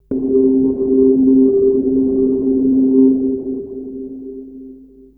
Synth hits and riffs

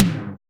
aggro tom hi; Drums In Your FACE!

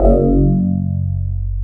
DS8 F#1, Korg; Back In Time Records; Korg Universe VOL-1

